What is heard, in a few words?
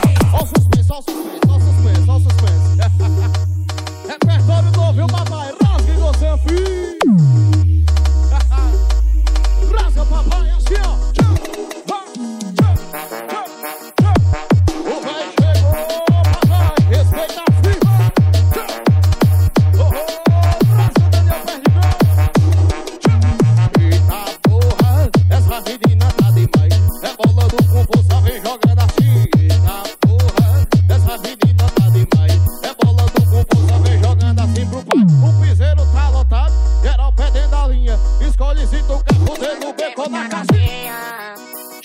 funk bass